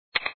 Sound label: real Click; dig it